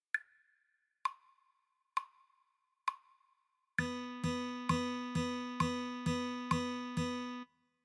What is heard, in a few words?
8th notes